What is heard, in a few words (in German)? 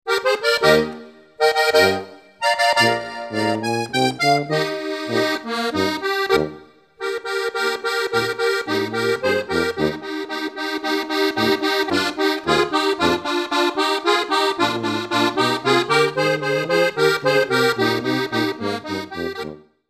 glockl